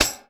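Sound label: SN+TAMB, snare tambourine; CONSTRUCT 2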